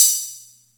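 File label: Atlantex Music Percussion Computer hi-hat open; Atlantex MPC-1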